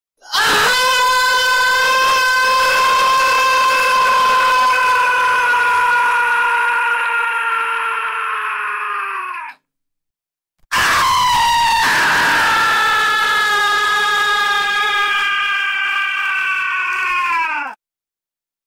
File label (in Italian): le urla piu epiche di sempre effetto sonoro sound effect scream